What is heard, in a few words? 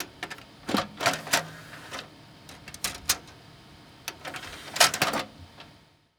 Technology Electronic Drive Cd Computer Drive Running Open Drive Place Cd In Close Drive